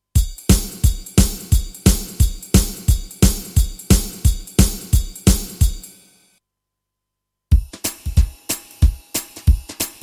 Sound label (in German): rhythmus